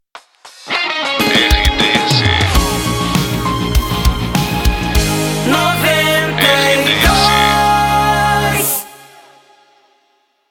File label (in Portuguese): SB CURTA FM; 92 FM